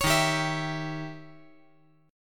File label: D sharp 7th Flat 9th; strummed; JGuitar